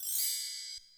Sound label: Chimes, 075 Emin, Hip Hop